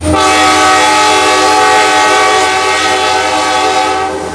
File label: horn